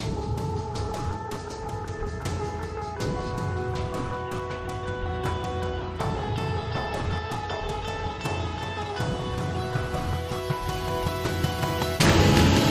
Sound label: Alt Percussion